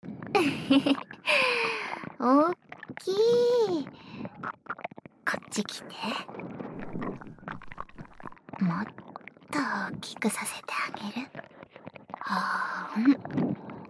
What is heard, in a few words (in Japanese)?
耳舐めされながらフェラ→正常位でイってお掃除フェラされる音声; だらイチャえっち。だらしないダウナー彼女と雌フェロモンだだ漏れ密着汗だくセックス